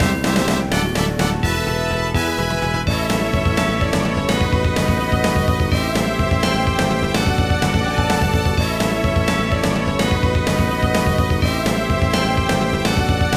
Result 2 (Fanfare); Mario Golf Toadstool Tour

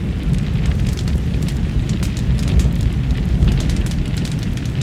Burn Armored

